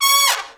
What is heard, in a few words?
SECT BRAS FALL